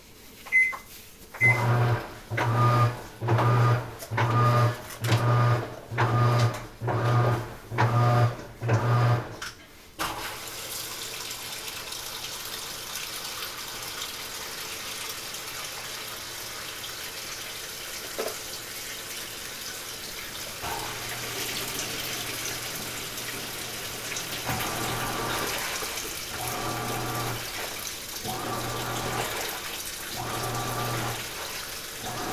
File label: Washer Ambience; kiki trick wii soundtrack